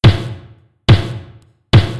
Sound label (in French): 3 COUPS DE MAILLET